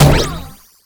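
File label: Launch Shroom Projectile Bounce; Warframe; Nokko; Launch Mushroom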